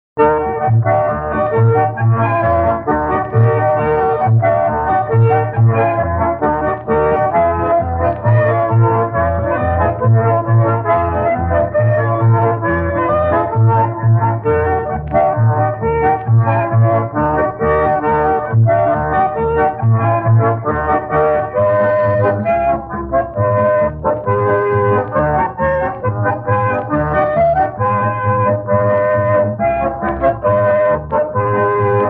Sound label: Katarina Polka, concertina